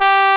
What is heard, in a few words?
BRASS G3, pop brass; AKAI S6000 CD-ROM - Volume 1; BrassWind